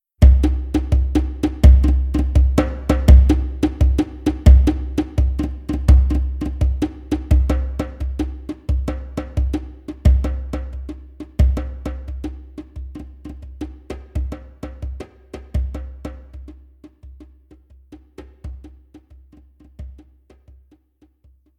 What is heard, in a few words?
DJembé